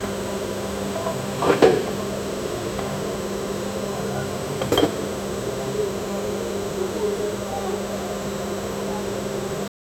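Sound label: ambience sound of a coffee, workplace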